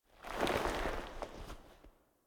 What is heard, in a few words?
Foley Kneel; Cinematics; Stalker; Warframe; Orbiter Cradle